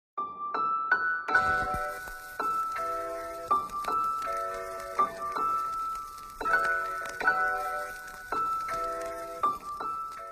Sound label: piano ringtone